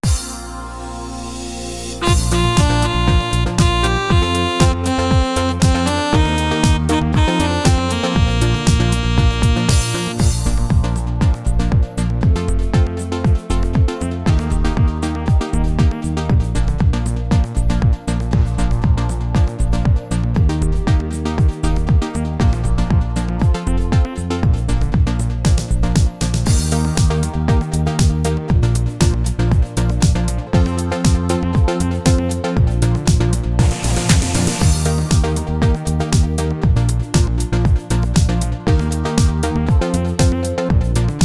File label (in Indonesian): Instrumen Menyanyi Dan Menari